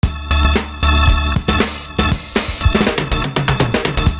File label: FILL N ORGAN; Univers Sons - Kitch 70s